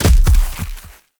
Rock Trap Rock Fall; Objects; Ode Lantern Crate

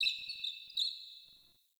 Under City Alley Critter

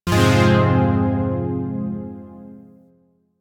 Shawzin Min Chord G; Chords; Warframe; Zariman

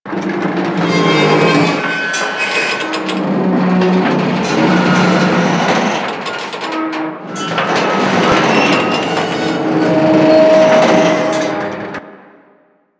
Radio Tower Movement Loop; Ambience; Props; Warframe; Nineteen Ninety Nine

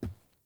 Grunt Boot Walk Wood; Sinecure

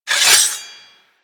Katana Unsheath; Swords

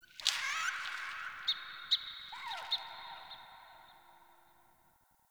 Trapped Animal Chatter Distant; Ambience; Ode Sky Fortress; Fortress Tunnel

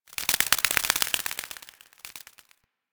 Forest Butterfly Flaps; Warframe; Grineer Forest

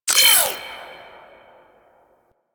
Library Scanner Scan Finish, UI; Warframe

